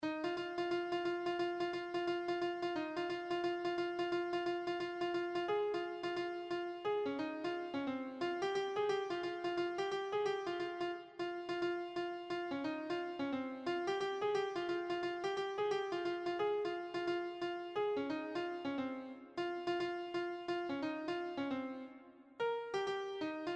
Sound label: lead, piano